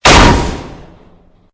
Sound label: cage land; CHQ SOS; Poodletooth-iLand